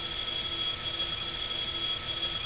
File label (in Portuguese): abelha; GEOGRAFIA